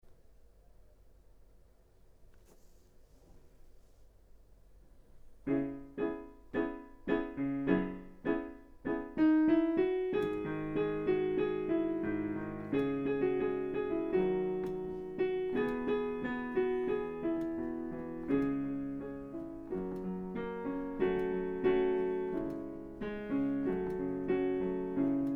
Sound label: Last Piece No 3 WO Vocal